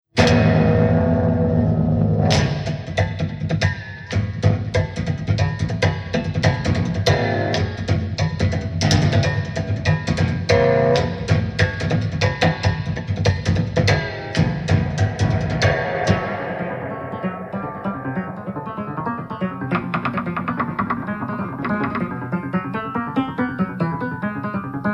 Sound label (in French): piano percu balum; citypercussion